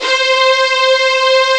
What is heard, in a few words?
STRING; Descent II